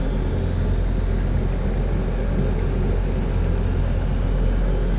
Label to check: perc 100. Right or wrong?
Wrong